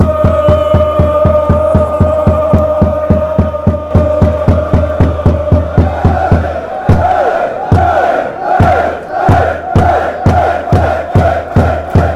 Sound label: woah hey hey hey, fanchants